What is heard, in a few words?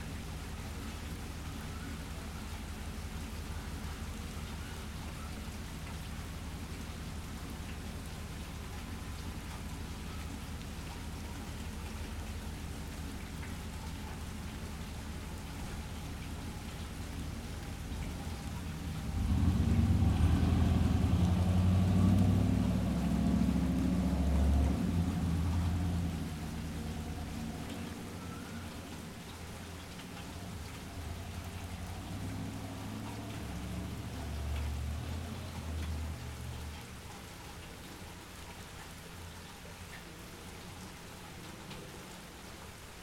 Nashville Rain